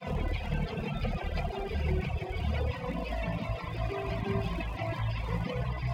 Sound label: Groove DUET